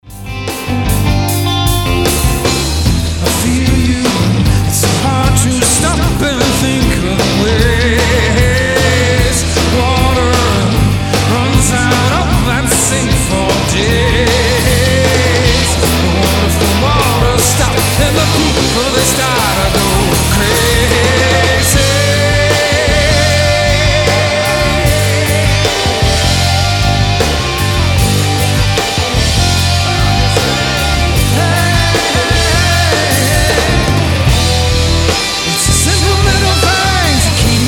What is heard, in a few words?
American Rock Master